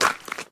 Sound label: ice step; Golden Crayon Sounds